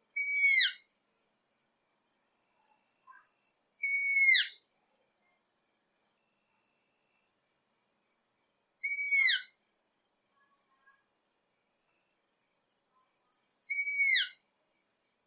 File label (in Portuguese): Canto da Coruja Orelhuda